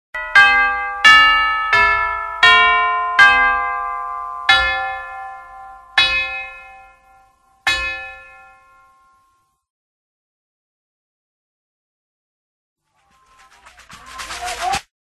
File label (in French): Jeu de cloches tubulaires Percussions